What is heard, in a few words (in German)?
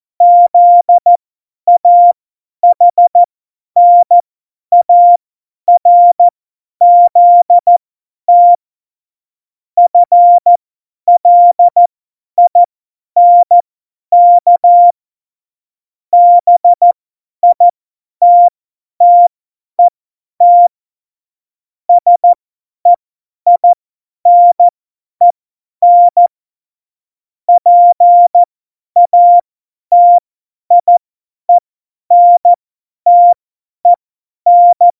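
Witz, Morsekurs, cw morsen; Witze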